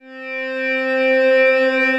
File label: Dwarf Planet Pad, Synth Pad; Ableton; Factory Packs; Vibrations; ModeAudio